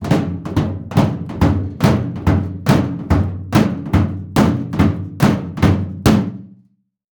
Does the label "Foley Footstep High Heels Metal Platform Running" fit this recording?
Yes